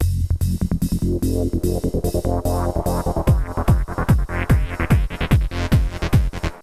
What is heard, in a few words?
Essential beats; FM 57 - The Ultimate Sample Collection (1997)